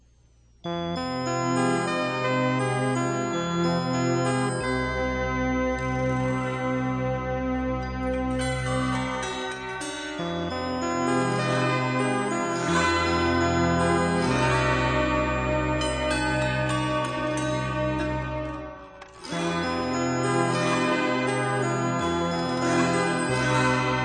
DX7 (Horn-Chime), P600 (strings), AHarp, "6 breathing"